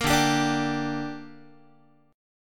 D Suspended 4th Sharp 5th down Guitar 4A,4E,5A,5D,5G sharp,6C sharp; strummed